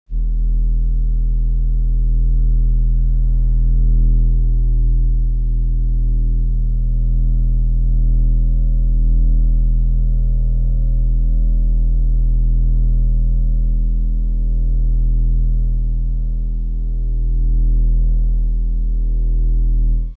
scan; The Ultimate Human Body 2.0; Dorling Kindersley

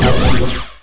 vorp turn, weapons; Hexen2

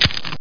CHIP CRNC; 2000 Sound Effects

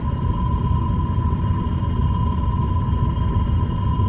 AMBIENCE Space Station

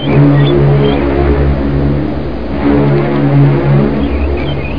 Ocean Blast Birds; Museum of Anything Goes

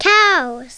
cows; Yondo, The - The Cyberplasm Formula (1994); Sanctuary Woods; More Fun!

